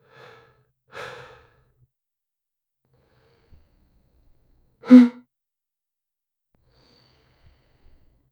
air suction

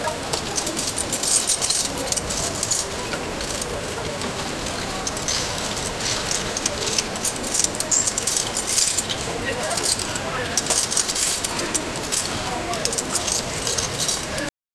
the scrape of metal; crafting

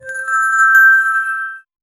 appearance of the fairy

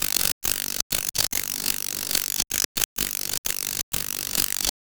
create a sound effect of, electricity

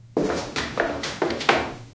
extremely fast inhumanly, hallway

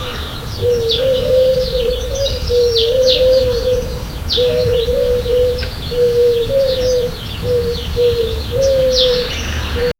a peaceful village early; morning

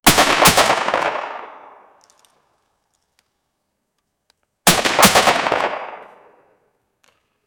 weapons

